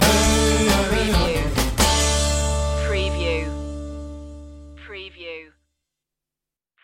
reservation blues stinger

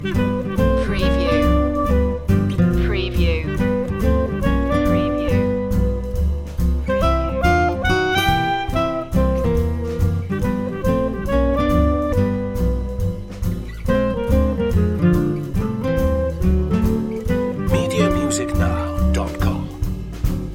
big boy blues loop

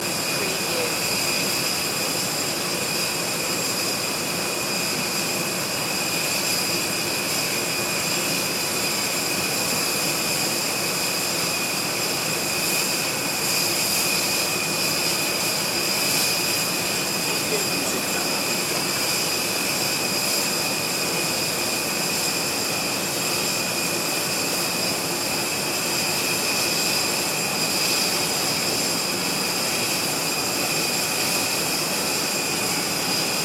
idle airplane engine loop

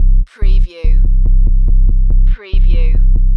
Techno-Trance Sub Bass Loop in C 142 BPM

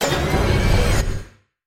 sci-fi door movement

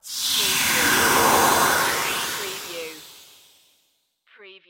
Whoosh; Sound Effects Bible

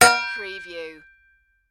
Metal Ring

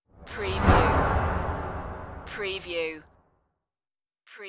Rush

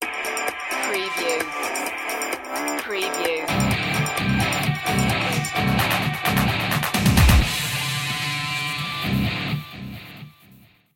80s revenge stinger

